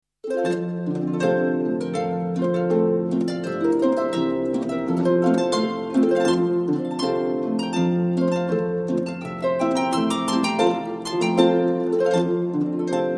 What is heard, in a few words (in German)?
boarischer